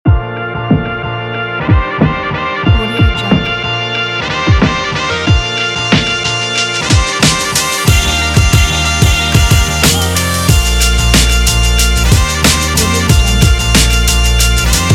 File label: this hiphop